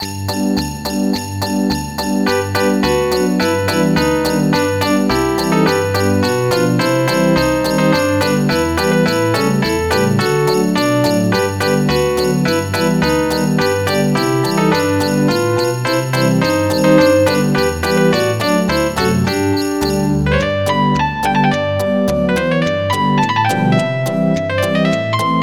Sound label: jingle